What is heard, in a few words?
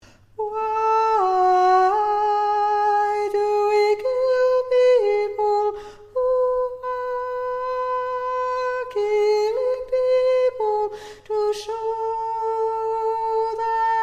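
Chorus Tenor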